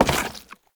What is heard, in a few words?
Step Goo; Warframe; Oraxia; Foley